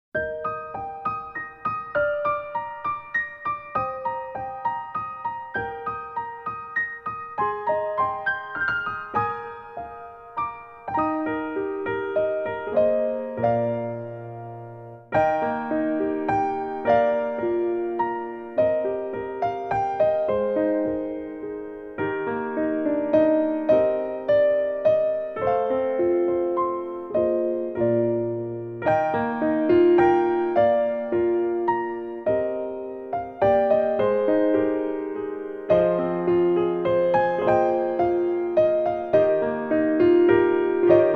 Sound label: Piano ver.; Blade Dancer; Aika